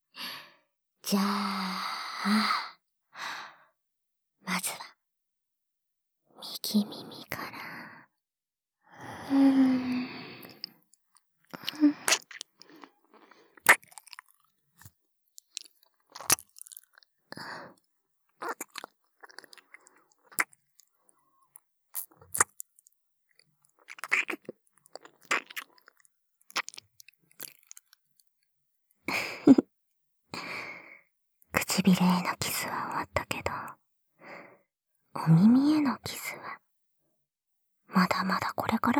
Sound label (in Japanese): 耳舐め, ASMR